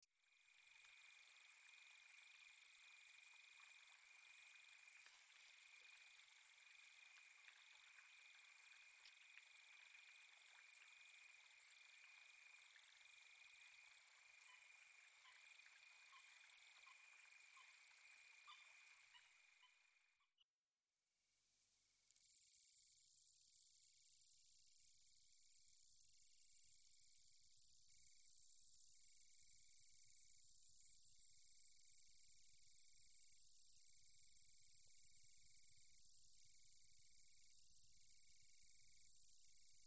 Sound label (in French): conocephale gracieux ruspolia nitidula, stridulations; naturophonia